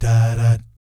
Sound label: DAH DAT, Vocal Jazz FX; Spectrasonics; Vocal Planet; JAZZCOMBO